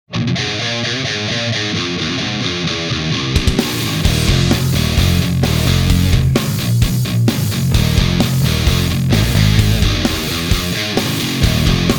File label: Low Down Eminence The Wizard